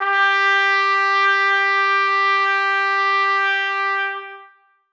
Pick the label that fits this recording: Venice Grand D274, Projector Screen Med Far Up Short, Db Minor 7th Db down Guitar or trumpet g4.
trumpet g4